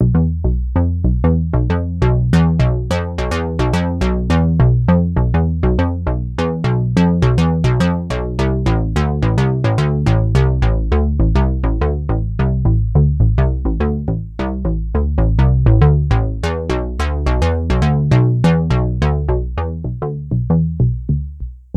Sequential; Sequential Take5

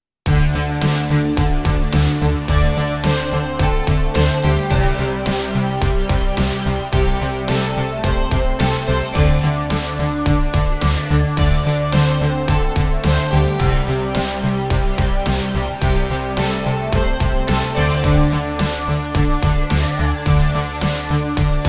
Korg KROSS 2 Synth Combis

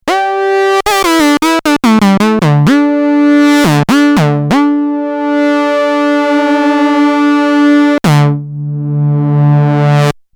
MicroBrute Quint Lead

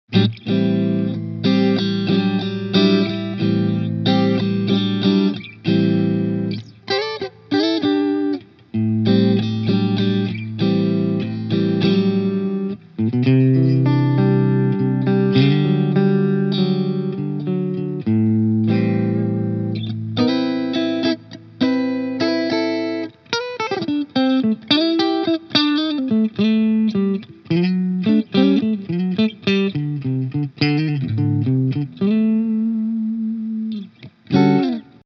MicroCAB 1 x 12 clean; Harley Benton MiniStomp

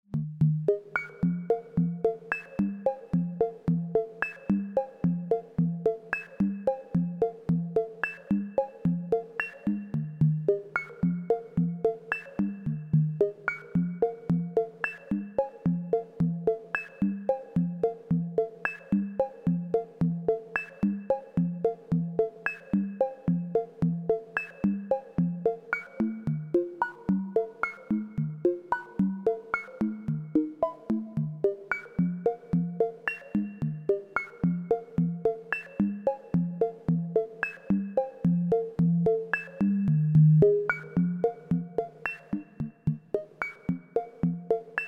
Serge Crocodile Pinging

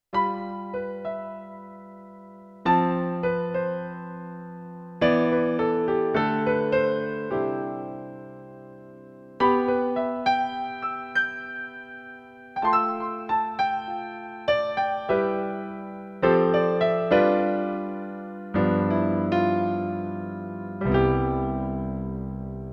Pianobox A Piano

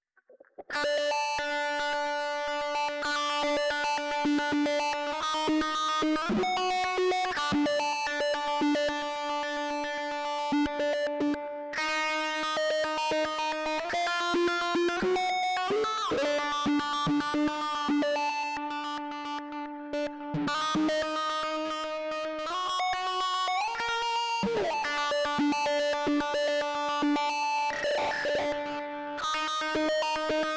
Zoom Random Filter

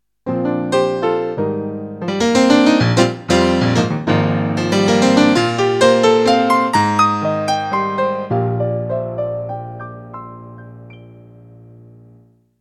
Piano

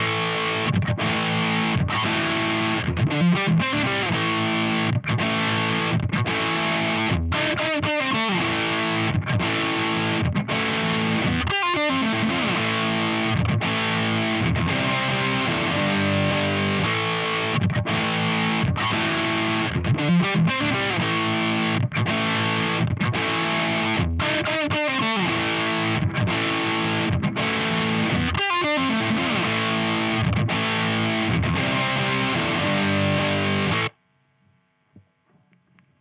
Mooer Soldano Slo 100 Slo Cabinet; Audiovergleich Mooer Nux

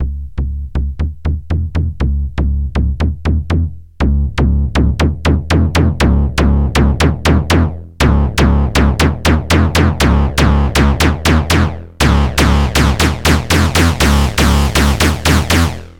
Behringer Neutron Patches Timpani

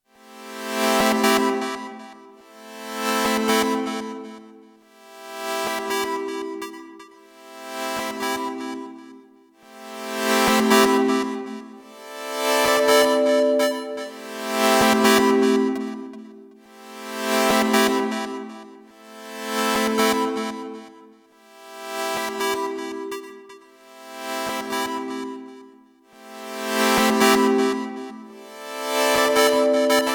Sonicware ELZ 1 Standard OSC OSC Type Mod

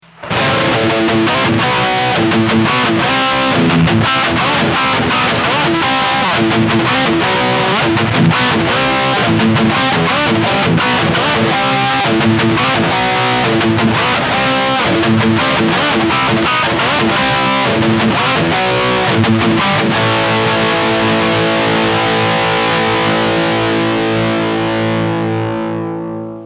Two Notes ReVolt Guitar Preamp High Gain

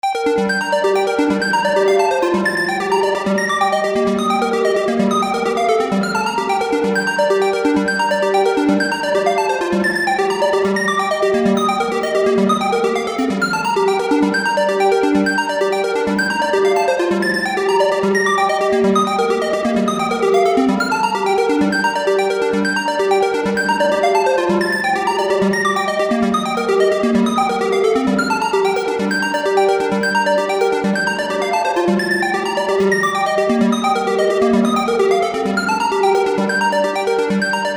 JX3P House Airy Pluck wet

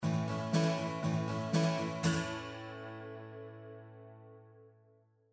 PCM81 Chorus

